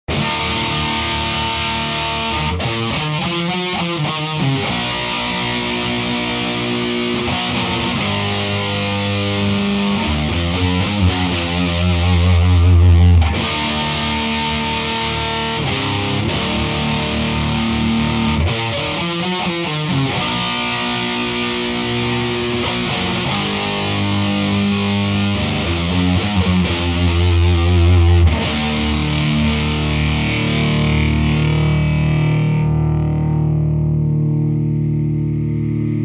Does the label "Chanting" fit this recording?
No